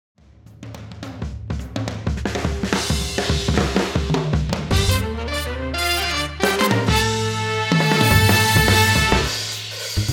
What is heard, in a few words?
Drums jazz; Addictive Drums